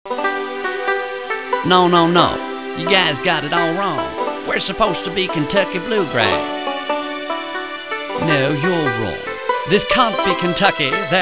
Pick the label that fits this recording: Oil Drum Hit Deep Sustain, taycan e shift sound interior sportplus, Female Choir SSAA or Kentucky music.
Kentucky music